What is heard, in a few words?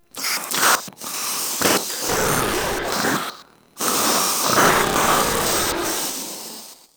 Proto Infested Runner Idle; Warframe